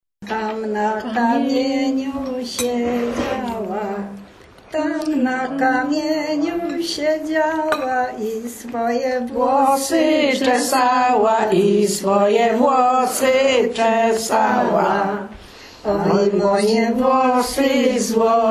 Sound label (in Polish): pieśń; Tam na kamieniu siedziała